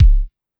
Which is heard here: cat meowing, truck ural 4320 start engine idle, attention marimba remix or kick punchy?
kick punchy